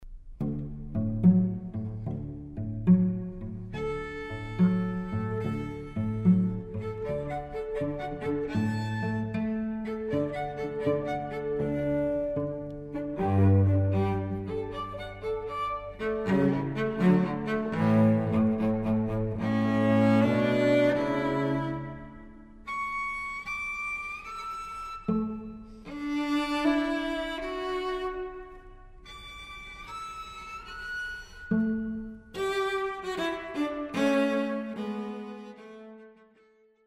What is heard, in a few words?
duet harmonics